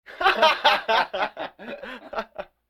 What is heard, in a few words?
Vocal effects breathing Moaning Baby